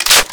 spas12 reload open, foley

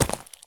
sprint slate; Escape from Tarkov

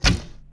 Bow Release; Civ4 warlords

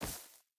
footstep burlap grass run; Rust

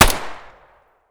gyrza distant; Escape from Tarkov

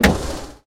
airbrake start; War Thunder

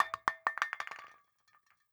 beancan grenade bounce; Rust

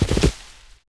Horse Run Forest; Civ4 warlords

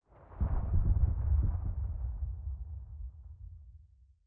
combat atmosphere; World of Tanks

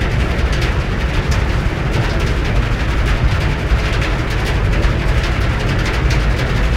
dive metal

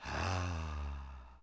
Science Ahh Vox; Civ4 warlords

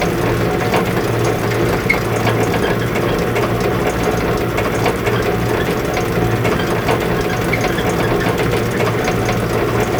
idle loop; Rust

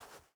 footstep burlap sand walk; Rust

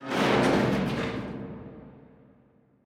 damage hits explosions

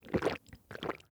drink; Rust

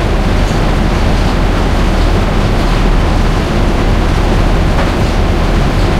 panther engine int rpm, engines tanks; War Thunder

